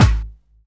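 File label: snare drum bass drum, Dance Mega Drums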